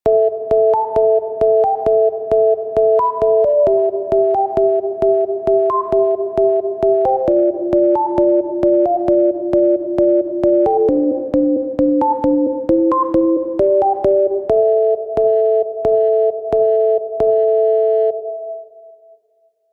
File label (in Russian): melodija na sms